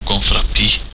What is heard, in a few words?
pee